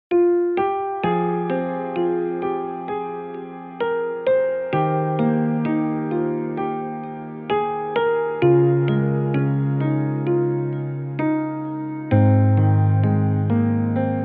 Light Ringtone

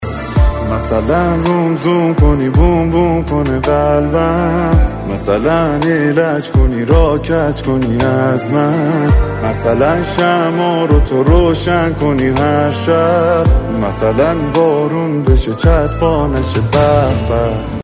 Light Ringtone

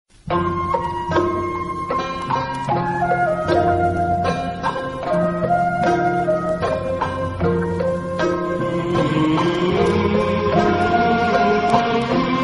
Japanese Ringtone

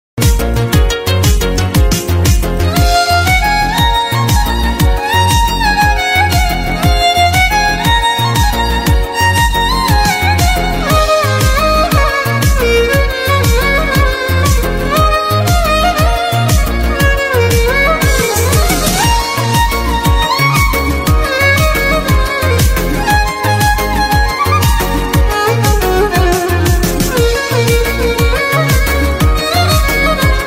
Violin

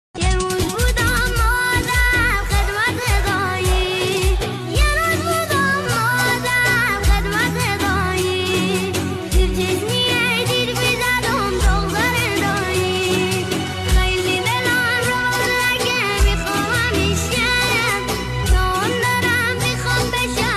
Kid Voice

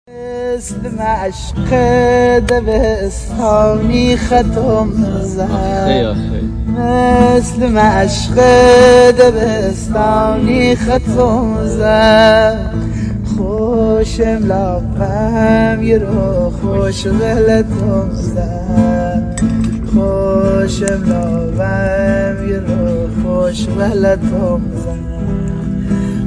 Guitar

